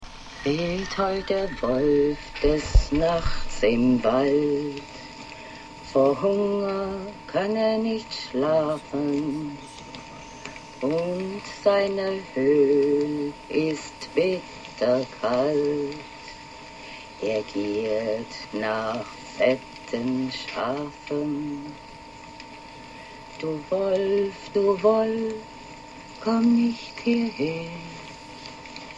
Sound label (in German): Wolfs Lied singt; Ronja Raeuber Tochter; Lovis